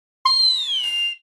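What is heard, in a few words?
Cartoon descend funny gliss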